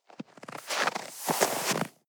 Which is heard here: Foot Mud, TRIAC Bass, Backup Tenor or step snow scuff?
step snow scuff